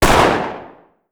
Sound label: battle fire, battle rifle